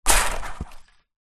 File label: sport soccer ball goal score ball hit goal net